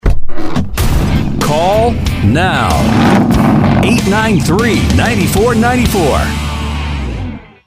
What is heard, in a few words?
Speedway Sounder; Lake Ozark Speedway